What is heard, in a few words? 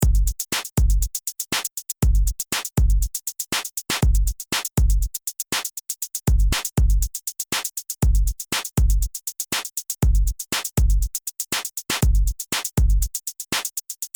technogeek